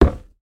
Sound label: foley footstep single wood loose slight rattle boot